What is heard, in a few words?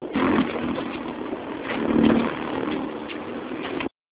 Stag Beetle